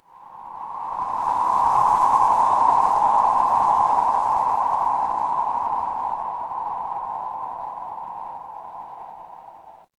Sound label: horror wind whisper